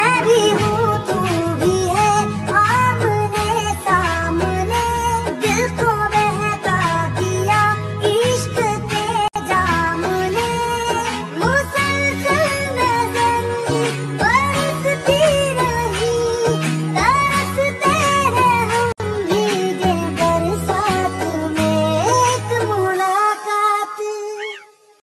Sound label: hindi songs on flute, bollywood ringtone